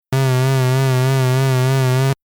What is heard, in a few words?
synth lfo amp